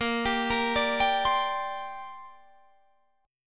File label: A sharp 6th Add 9th, Guitar Open D